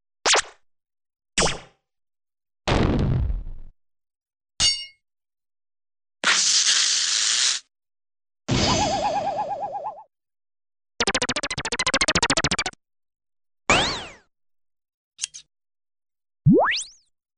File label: Sound Effects; Puzzle Bobble 2; puzzle bobble variety